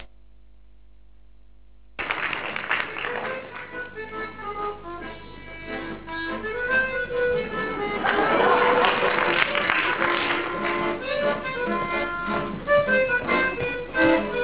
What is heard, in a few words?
tango